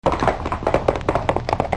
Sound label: Galloping Horse; Animals